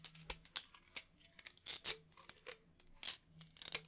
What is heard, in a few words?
tinkle, tavern; AMBIENT; Pirates Of The Caribbean